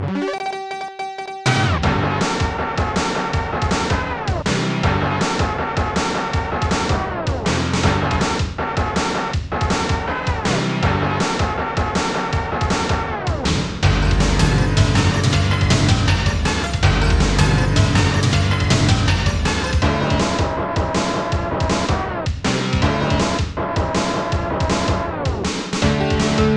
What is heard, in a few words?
Battle; Zool Majou Tsukai Densetsu; n64